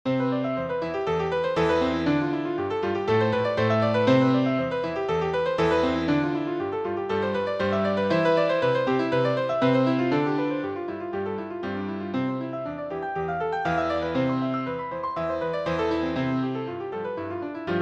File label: toccata, piano; petite suites